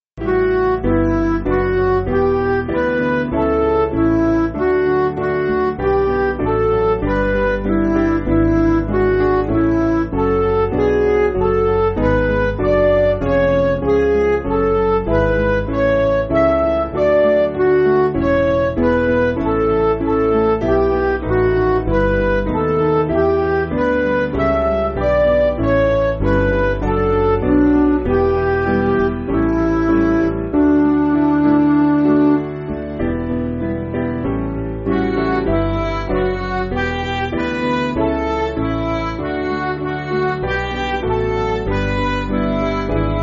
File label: Midi; Thou Hidden Love; Aldersgate St